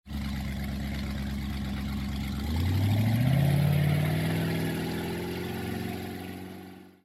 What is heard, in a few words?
Boat Leave